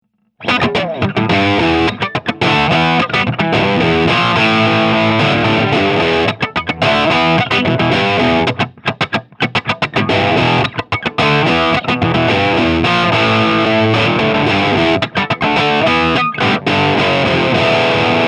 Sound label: Tilt 0 Boost